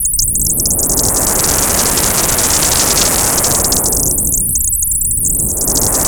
WIND RATTLER; Zero-G Ambient 1; WINDRATTLERS

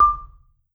marimba soft D5; Sampleheads - Dave Samuels Marimba & Vibes

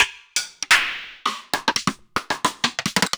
Akai XR-20 Rimshot

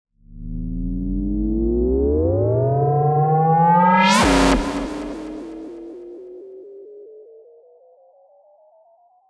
Delayed Synth FX

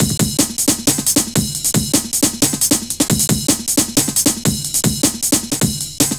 Sub Urban, programmed loops; Zero-G Jungle Warfare 1, 2 & 3

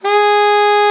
SLID G#4, ALTOSAX; Xsample VOL-2

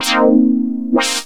chord, Drum & Bass, 168 BPM; Ueberschall; Drum & Bass Flash Trackz

